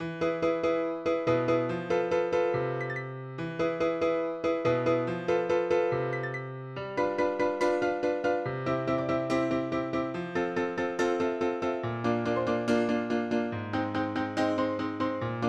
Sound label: [Splendid Piano] woods